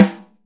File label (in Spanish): CAJA, percussion; DRY; MARKYDRUMS